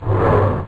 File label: rogue close; Kaleidoscope Schemes; Midnight Amethyst